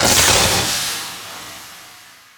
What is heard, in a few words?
shock; dota; shadowshaman